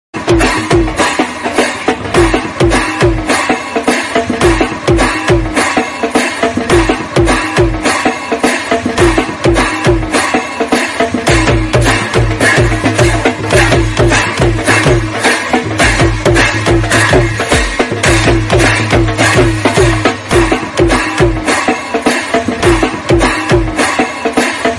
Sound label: mahakal aarti bgm ringtone; ujjain mahakal